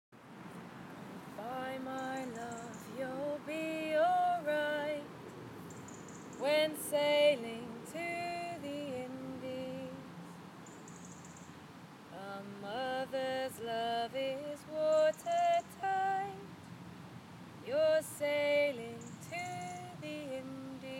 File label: lullaby; from the female warrior